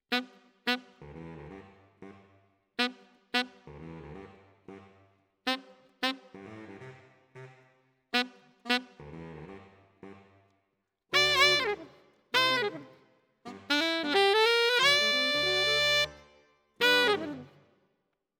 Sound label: Alto, RNR for piano